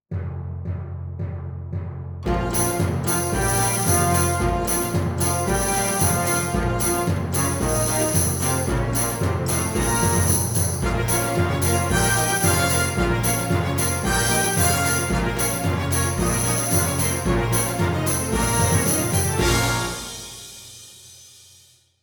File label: Russian Folk Song